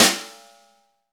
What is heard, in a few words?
SNR, Snare; Ext.Snare; Club-50 - Foundations